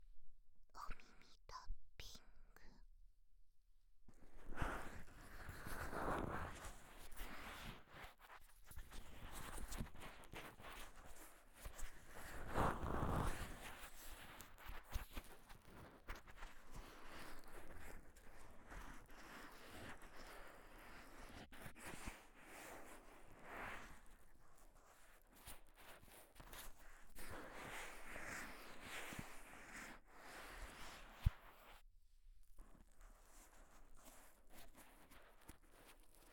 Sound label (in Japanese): 安眠用マッサージ; 右耳